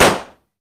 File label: pop; geiru